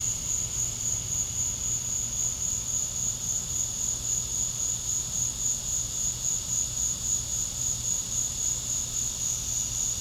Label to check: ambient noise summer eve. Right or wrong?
Right